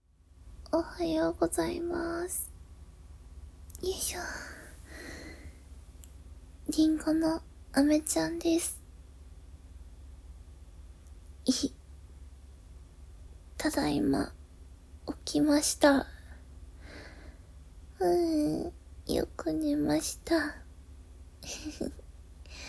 寝起きおしっこ

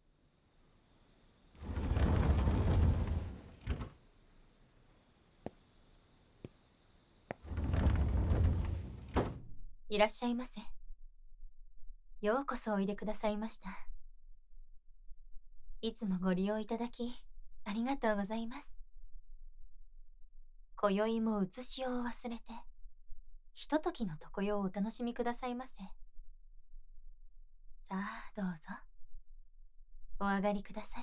耳かきと耳舐め; おもてなしの耳かきと耳舐め手コキ; SEあり

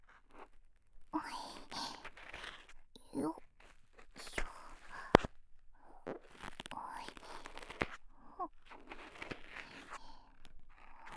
右耳 耳かき ループ音源

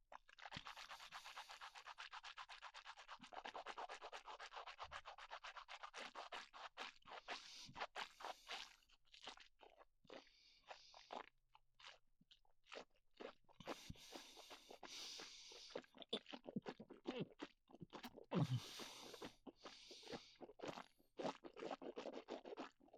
口 ゆすぎ

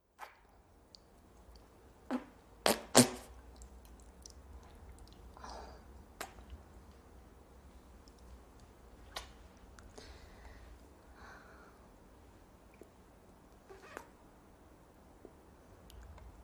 人妻がチンポをしゃぶりつくす! ガチのフェラチオボイス

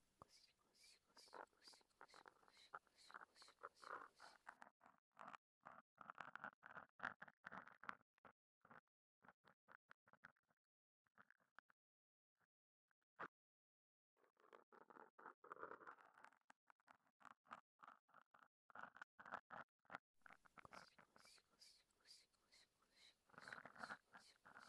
ごしごしと耳かき L

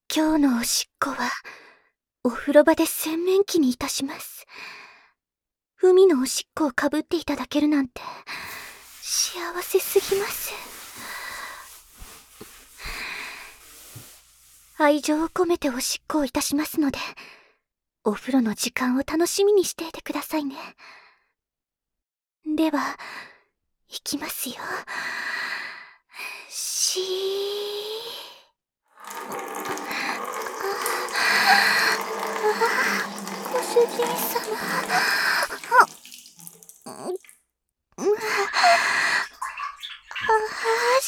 今日のおしっこ お風呂の準備で洗面器に

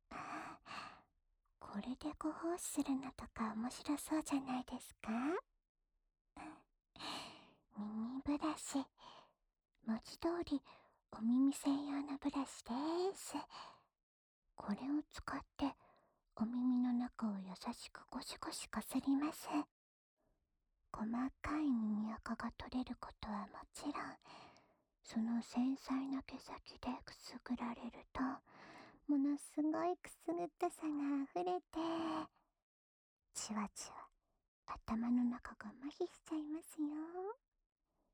耳刷（右耳）, ASMR

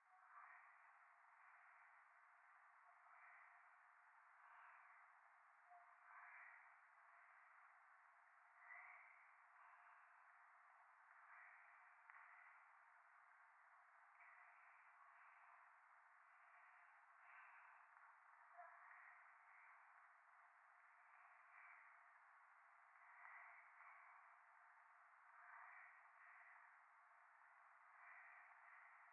右耳 密着 吐息 雨; 夢の中

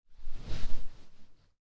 ソファー のしかかる; 効果音

